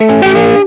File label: window move start; jam; stereo